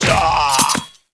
Die; Butcher; Eft

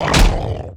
panda hit

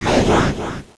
Summon Monster

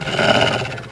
HYENA GROWL

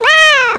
Rabbit Die

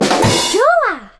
Success; Eft; Mini Game; Monster Corporation

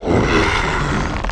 MANDRAGORA Hit; Eft

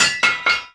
Weapon; Eft

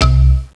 Quiz Wrong; Eft

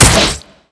Weapon arrow Hit

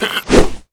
Halloween Pumpkin Attack; Eft

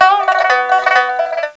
EAST GUARDIAN attack; Eft

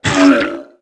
Die; Eft

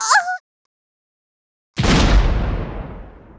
NinjaGirl die; Eft

